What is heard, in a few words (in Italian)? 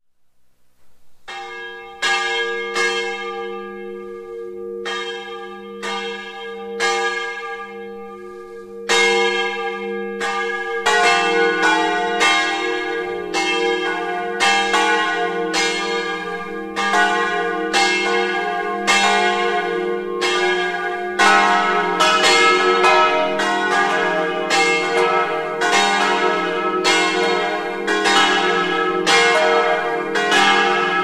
campane; tramonti di sopra